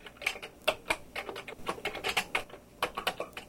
handset pushing buttons

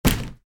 plastic container hit

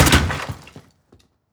wood chop; splitting

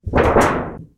metal sheet wobble

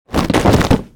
body fall

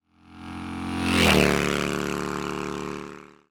motorcycle passing

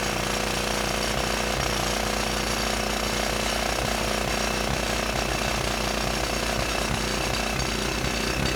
jackhammer

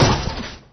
metal panel, metal panel hit

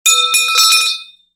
metal pipe drop

